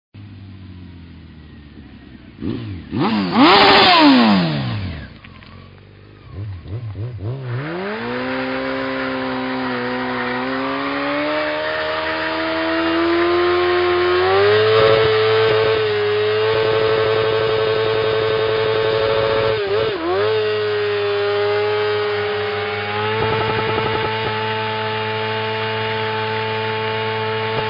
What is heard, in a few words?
Suzuki GSXR750, motoskanas